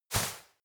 step walk textile light; schritte

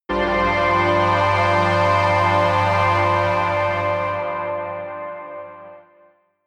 synth hover high, drones

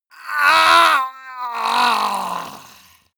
male die gurgling; soundpool

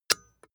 metal click

